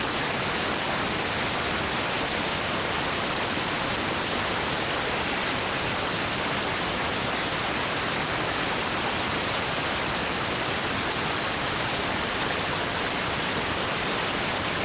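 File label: radioteletype receive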